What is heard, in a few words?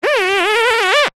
industrial duct tape rub finger squeak